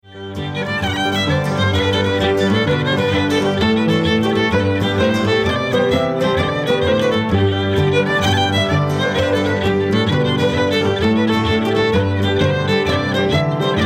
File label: Jig; Whisky Jig